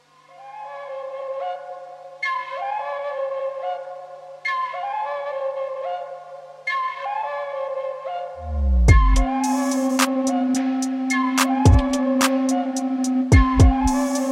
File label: Instrumental Version